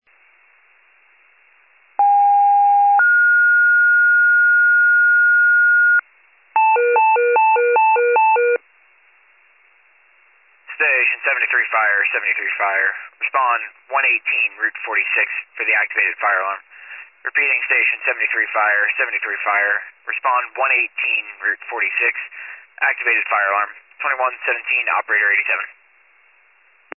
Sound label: fire, pager alert